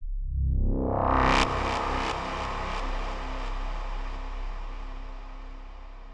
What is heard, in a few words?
Tone Riser (C2)